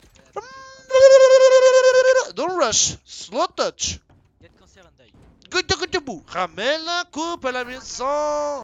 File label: lalalalalala